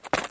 step; svencoop; legends

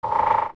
skulltula alert, enemies; zelda

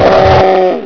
gon pain, spider; svencoop; classic

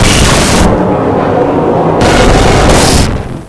flyer beam; svencoop; echoes

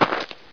pl dirt; mistake coop; player

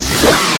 gohma pain; zelda

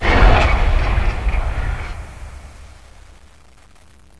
whoosh; svencoop; echoes; gman

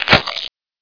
bite

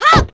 stick attack; zelda; weapons

